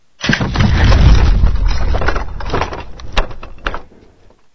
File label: Trebuchet Fire